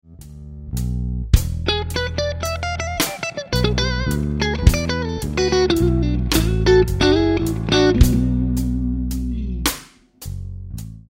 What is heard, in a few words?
Lick, guitar; Slow Minor Lick 12; Minor Blues licks